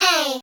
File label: Eey; Santies Panties